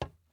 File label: glass step; teardown; ps5 windows xbox series xs